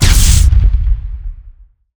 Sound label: Advanced Damage, bullet